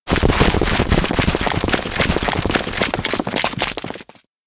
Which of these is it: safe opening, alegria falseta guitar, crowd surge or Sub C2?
crowd surge